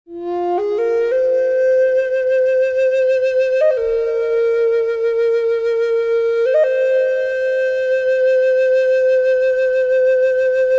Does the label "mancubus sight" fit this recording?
No